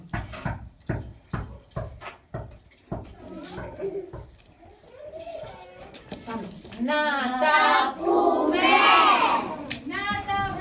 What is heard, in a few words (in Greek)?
Κάλαντα των Φώτων